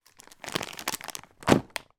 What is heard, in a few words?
pack of soap bars down on surface